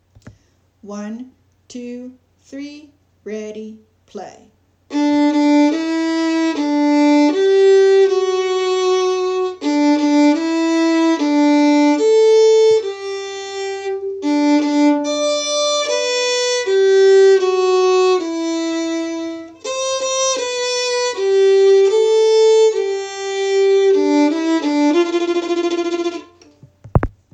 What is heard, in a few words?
Happy Birthday D String